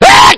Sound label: ugh